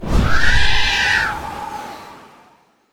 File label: Harpy Whirl; Legend of Keepers